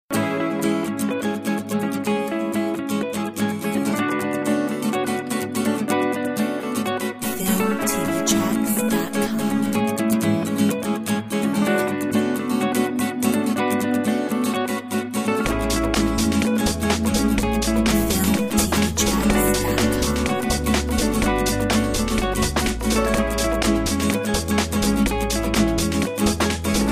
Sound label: Bossa